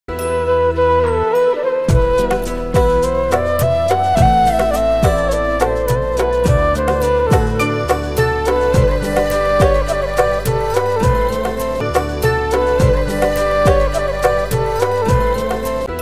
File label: drama ringtone sad ringtone; ishq murshid drama